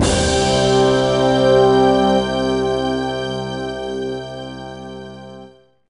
Mild Victory Hit; Pirates: The Legend of Black Kat

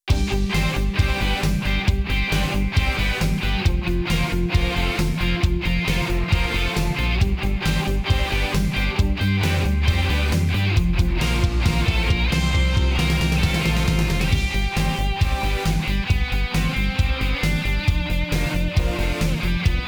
Radio 80s; Gangstar West Coast Hustle; Android

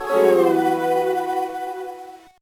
Failed, gba; Level 7, Level 9

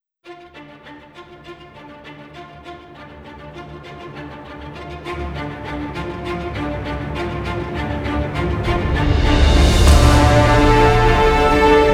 Cinematic Orchestra Ver.

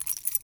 Panel Keys Open, sound effects; Among Us

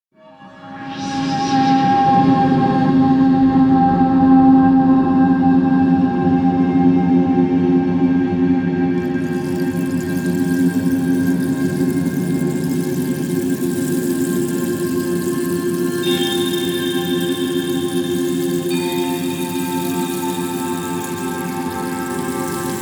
Cave Ambiance; Valley

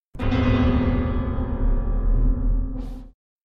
Jumpscare Doll School; Don't Be Afraid The First Toy

